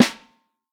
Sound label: Vintage Snare Drum